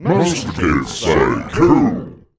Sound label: VOICE FX, Dance Vocals